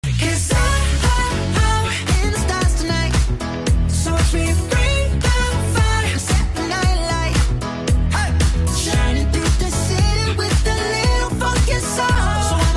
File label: ringtone